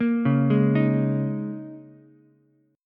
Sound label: A sharp Minor 11th A sharp down Ukulele C6, chord; arpeggiated; JGuitar